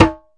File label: ROLAND SPD-20 PERC TABLA; ETHNIC and WORLD PERCUSSION